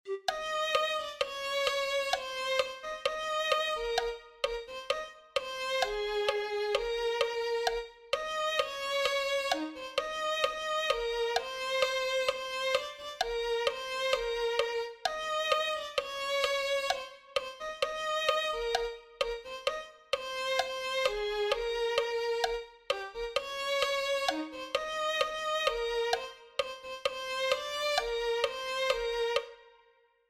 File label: violin